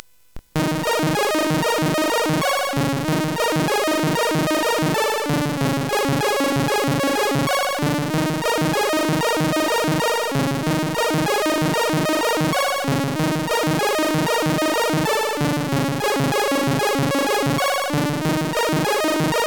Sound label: Arrrrpeggioz sid CSG8580R5; hvsc